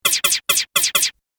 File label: Arcade Game Laser Rifle 5 Shots; Video Game Sound Effects; Retro Inspired Sound Effects - Think Nintendo, Atari, C64; Andrew Fleming